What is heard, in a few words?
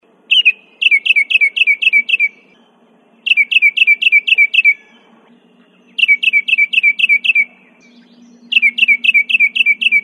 Baltimore Oriole